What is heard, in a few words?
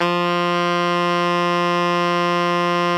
ALTO FF F 2, Sax